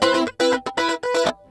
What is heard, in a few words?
GTR